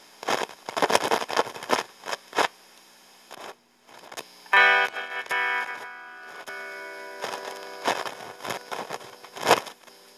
Guild Model4 1981 Turning Knobs amp; amplifiers

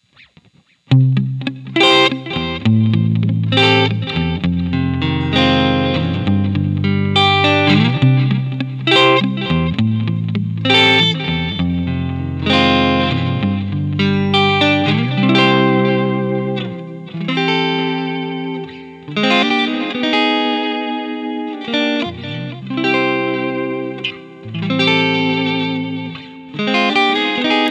Guild X170T Neck Single, guitar; Guild X170T Mars from 1999; ’65 Bassman

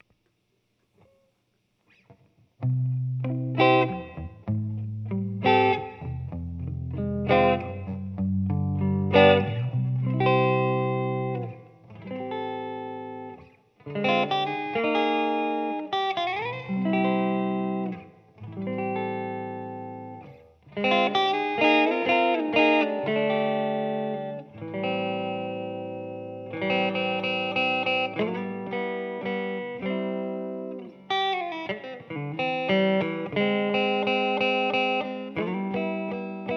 Neck Pickup, Guitar; Starfire4

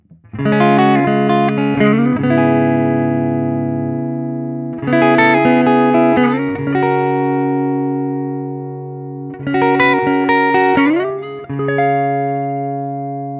Crossroads Doubleneck 6 ODS100 D, D-shape; Guild Slash Crossroads Doubleneck

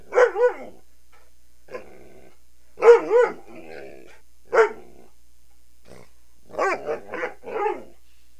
Lets Play Bark Mono

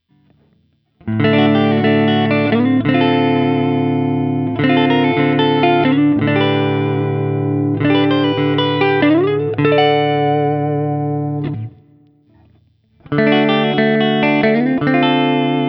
X170T ODS, guild x 170 t; Guild X170T Mars from 1999; D-Shape Chords

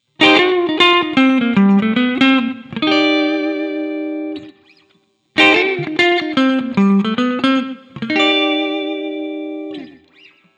X170T, End; Guild; Guild X170T Mars from 1999; ’65 Bassman

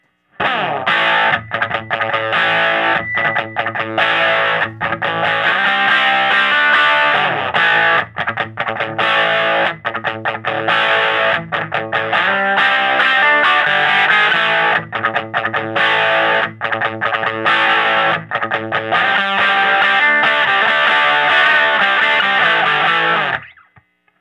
Guild Thunderstar Bass Starfire IV Riffage

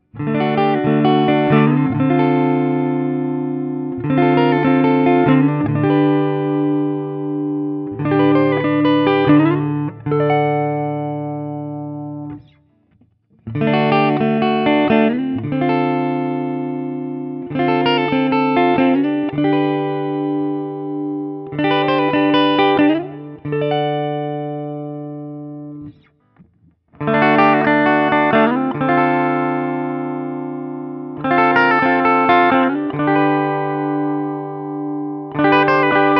Guild NS Starfire I SC; Guild Newark St Starfire SC

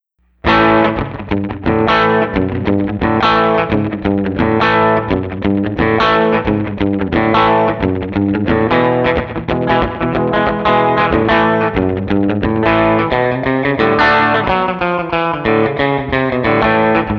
Guild X350 Stratford Bridge Blues; Guild NS X350 Stratford

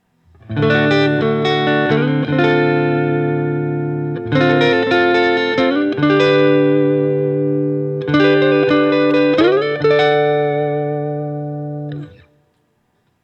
Guild S281 Flyer D; 1986; guitar

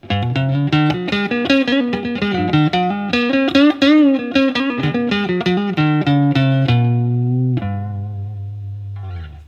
Guild X175B Neck Clean, guitar; guild 2015 newark street x-175b guitar

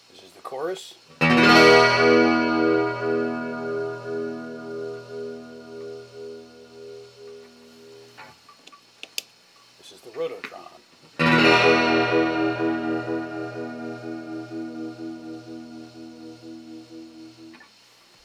Guild G600 Aspen Chorus vs Rototron; amplifiers

